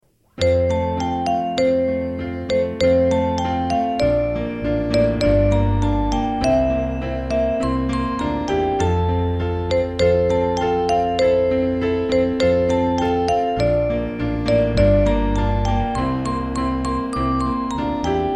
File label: jingle bells dur